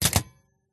typewriter; mkh8060; pmsfx

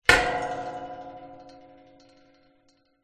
impact metal gate large farm hit post

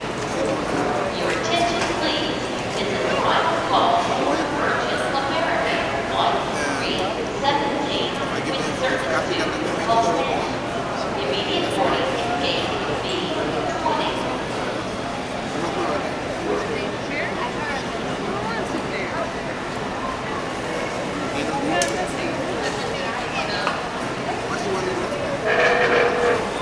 ambience airport departure lounge; jfk; sound effects one

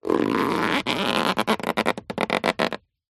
foley rubber stretch; zapsplat

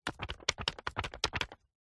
shaking a photograph; Sound Ex Machina

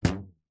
rubber band ping low pitched; foley

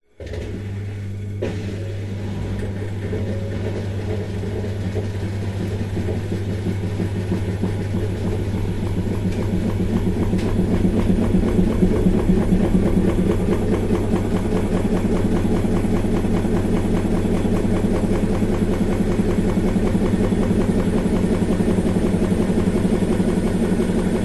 washing machine modern spin cycle; zapsplat

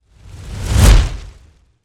Fireball Whoosh; Blastwave FX

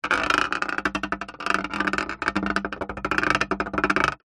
foley plastic bumpy object move over rattle

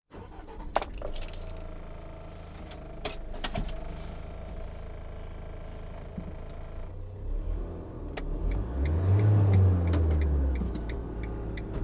van start drive stop internal iveco

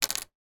household cell phone sim card drop on table

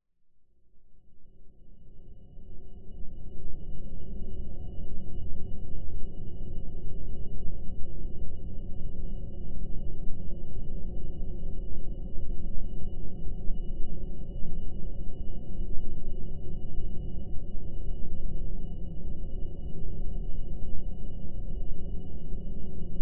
sound design drone subtle airy weird whining; zapsplat

